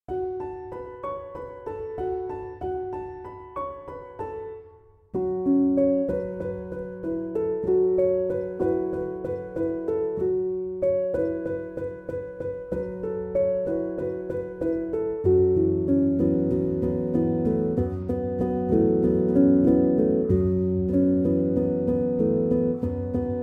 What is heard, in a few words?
piano instrumental